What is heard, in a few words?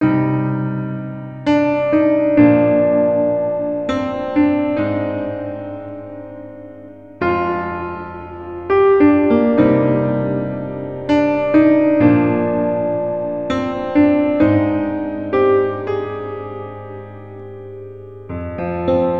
Simply Piano, Electro Lounge; Sonic Foundry (Sony Creative Software); 100 Divide This